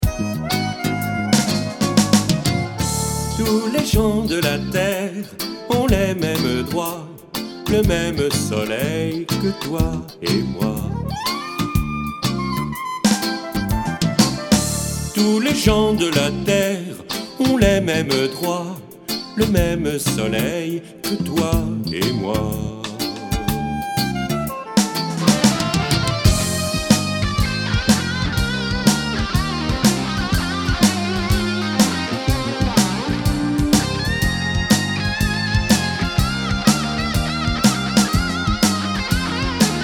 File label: ext reggae